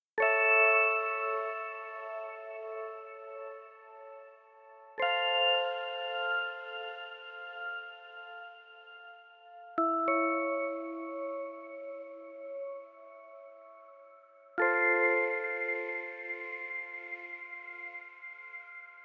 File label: Melody Keys